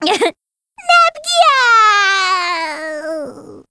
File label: ehehe